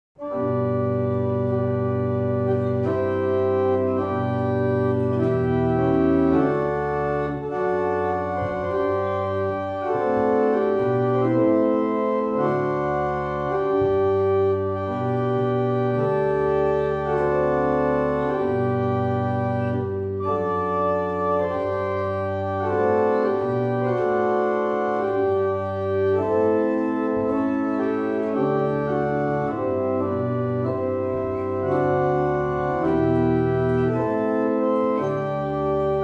Evening Hymn, barrel organs; Brightwell

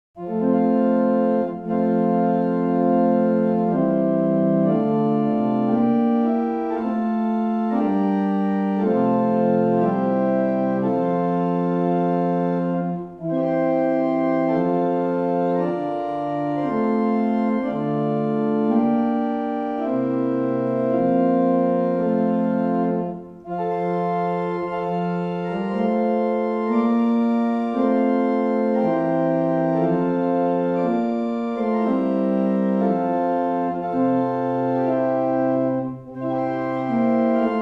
barrel organ; Llanfair

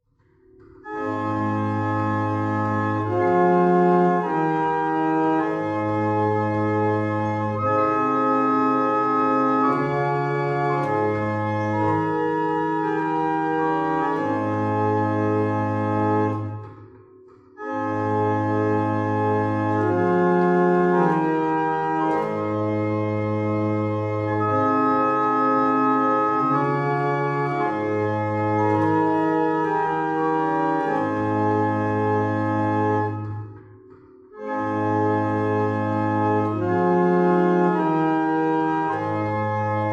Single chant, barrel organs

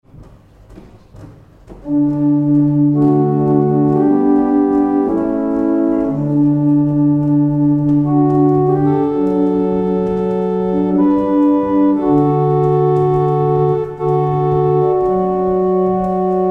barrel organ; Letheringsett

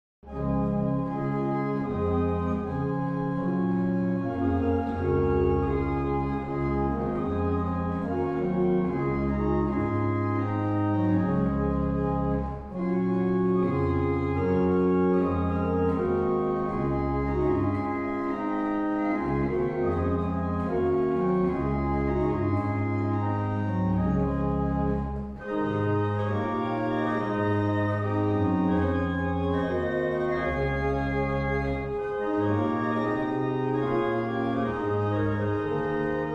Easter Hymn, barrel organs; Shelland

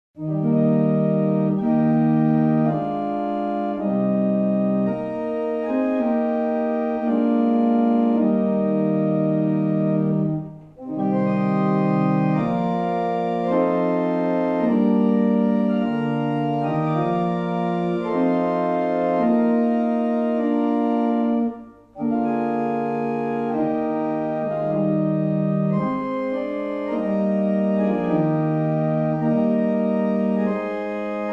barrel organ; Llanfair

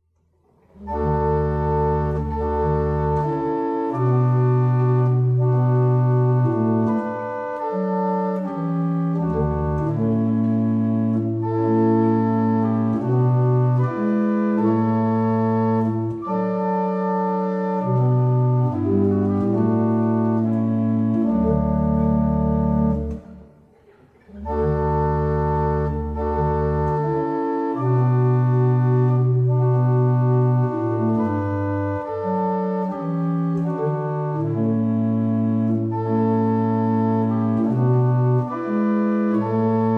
Double chant, barrel organs; Staunton

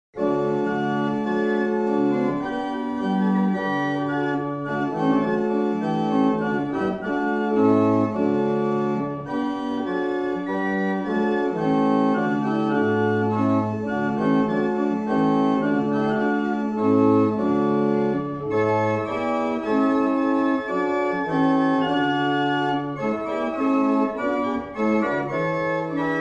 Easter Hymn, barrel organ; Mattishall

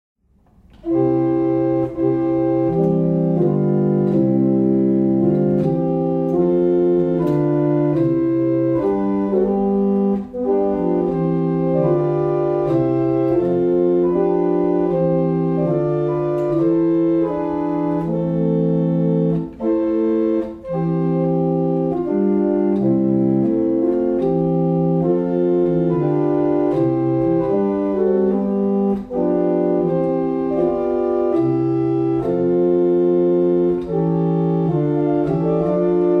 barrel organ; Lillington

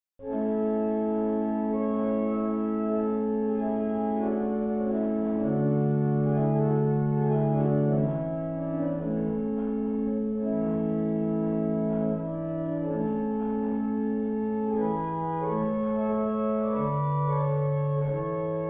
barrel organs; Sutton Beds; Helmsley

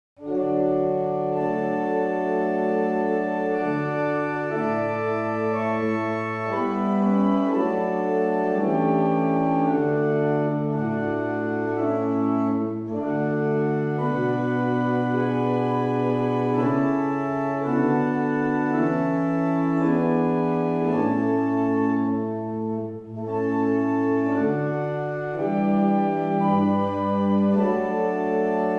barrel organs; Stratfield; Abridge

